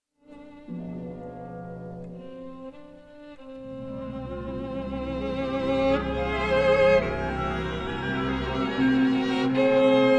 orchestra, violin concerto in b minor; Ulster Orchestra; Sir William Walton

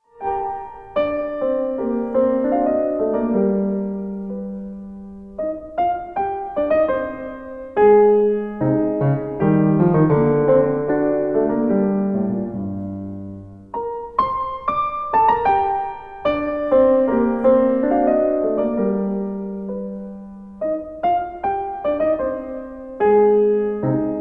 piano, polka in g minor; bedrich smetana; andras schiff piano; op 8 no 2

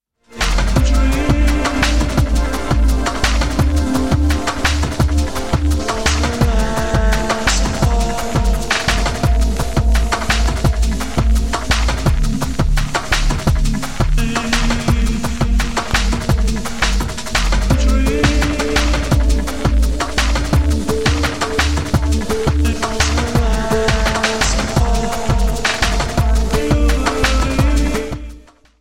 machinedrum

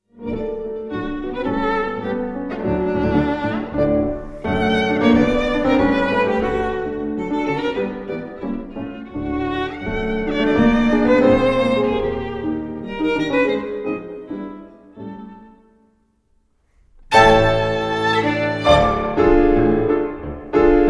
for violin and piano; fritz kreisler; marche miniature viennoise

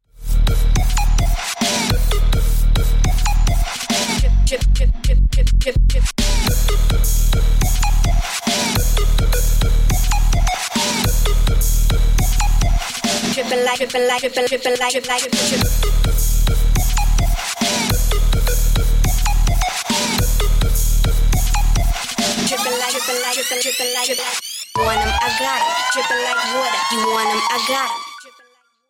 drums

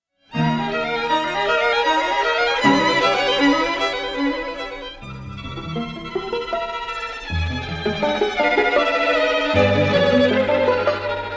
string quartet; maurice ravel

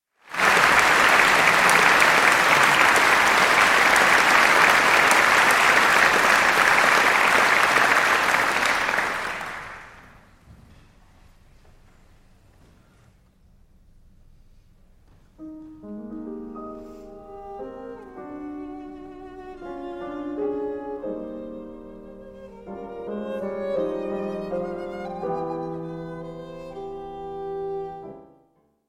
violin and piano; sergei sergeyevich prokofiev; 5 melodies op 35a

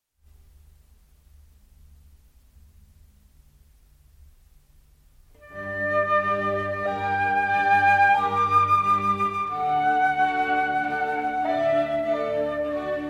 concerto in G for flute and strings; Severino Gazzelloni; Antonio Vivaldi; I Musici; op 10 no 6; RV 437

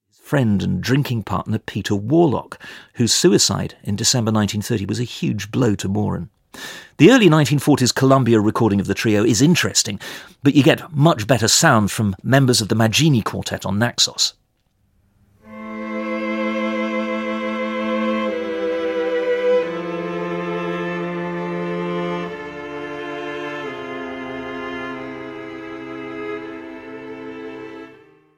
trio in g major for strings 2nd movement adagio; E J Moeran

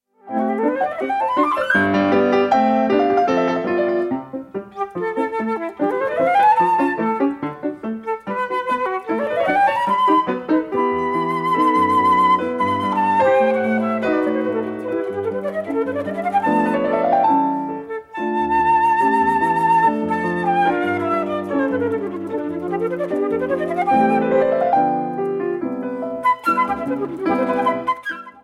fantasy for flute and piano; gabriel faur

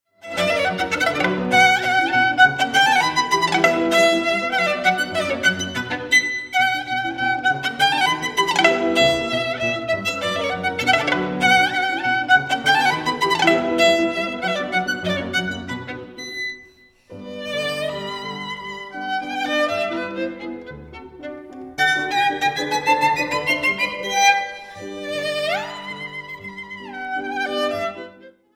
scherzo fantastique for violin and piano; op 25

